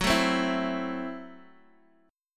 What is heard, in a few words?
F sharp Minor 7th Flat 5th, Guitar Open G; chordshape; down